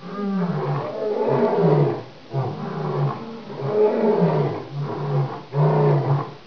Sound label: bears, animal; wanted